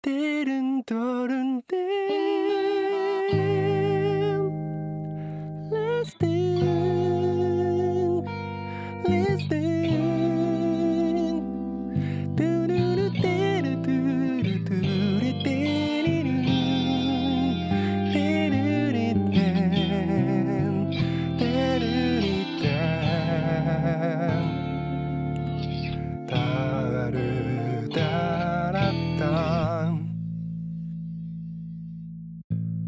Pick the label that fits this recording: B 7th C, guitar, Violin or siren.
guitar